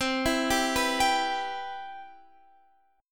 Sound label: E Minor C